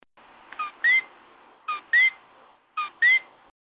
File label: Kiwi Call